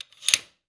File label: Changing Date